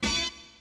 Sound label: philly hit; high pitch